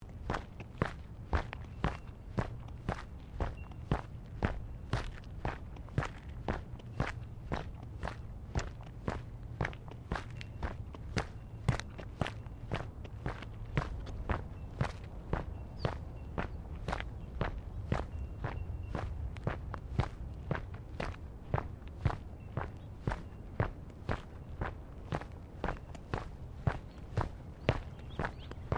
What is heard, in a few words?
FOOTSTEPS SNEAKERS PLASTIC GROUND MAT SEQUENCE; Footstep Foley; Footsteps (Sound Effects:Human)